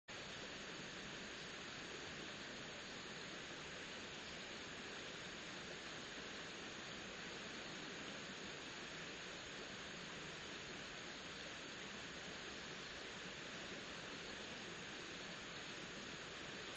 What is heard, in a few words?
riverside; Kyoto U; Ashiu